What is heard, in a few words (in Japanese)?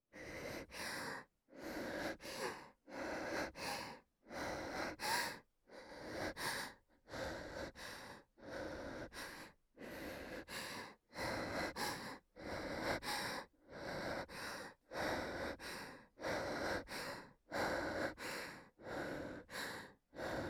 呼吸音